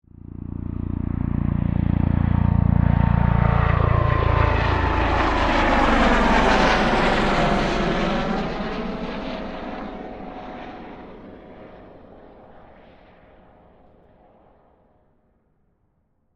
Helicopter Pass By, Aircraft, Helicopter, Pass, By, Overhead, Hh-65, Dolphin; Transportation; Helicopter Sound Effects